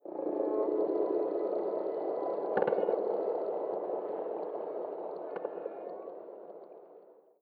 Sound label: echo clicks; ambient soundscapes; dota